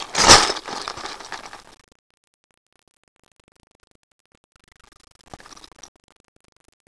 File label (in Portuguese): batida